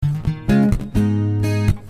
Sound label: Punchy Eb, Db, Abm 66